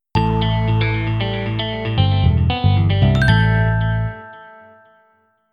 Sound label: jingle; garageband; Adas